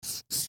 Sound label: pencil